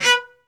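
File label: SOLO cello, Strings; ILIO; Synclavier Strings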